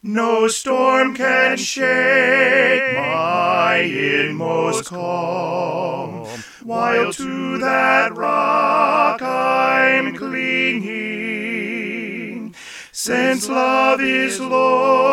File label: How Can I Keep From Singing Tenor; All 4 Parts with The Tenor Part Emphasized; McKinney GMC Choir